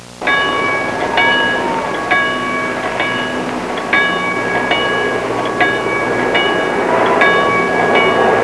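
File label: train; trasporti; treni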